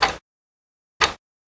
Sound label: Clock Loop